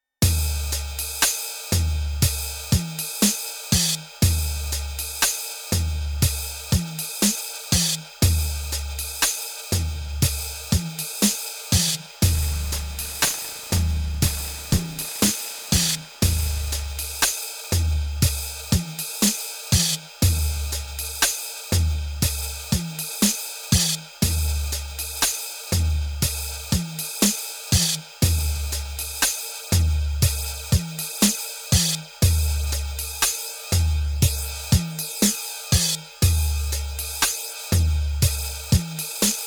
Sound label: NTS-3 Drum Chorus